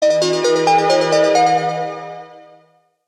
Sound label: ringtones, sony xperia z3